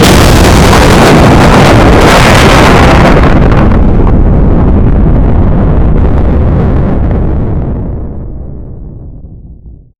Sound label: destruction